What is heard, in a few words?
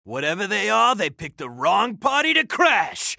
death; baseq2; turtle